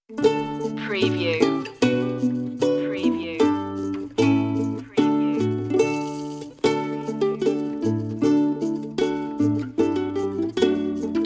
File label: deck the halls with ukulele